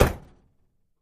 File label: Camaro Door Close, chevy camaro